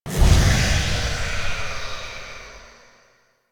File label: Survival Corrupted Aura Inner Spawn; Warframe; Zariman